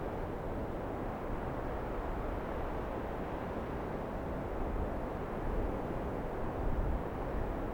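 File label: dust wind loop; Counter-Strike Global Offensive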